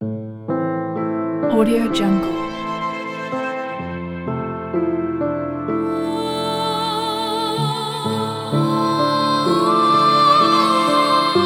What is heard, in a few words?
touching intro for great story ident; verymusic